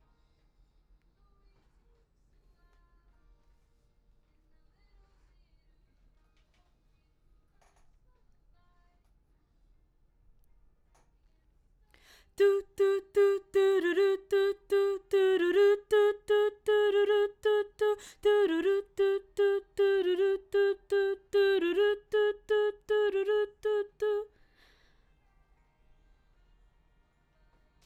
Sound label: BG Vox